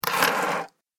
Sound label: Coins Heavy Slide Across Table; Money Sound Effects